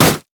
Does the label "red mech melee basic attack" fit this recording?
Yes